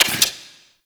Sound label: Fist Movement; Enemies; Warframe; Tyl Regor; Fists